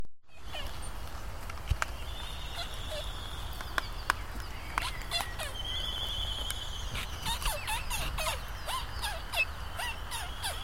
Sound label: Egg Song Laysan Albatross